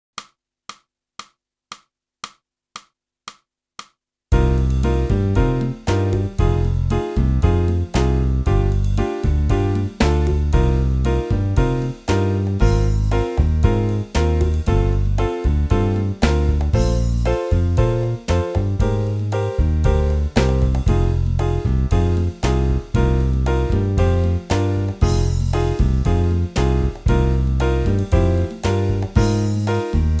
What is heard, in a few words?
clarinet; boosey method